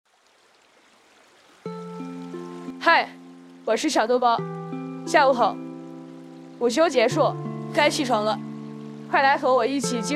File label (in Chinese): 午休起床闹钟 creek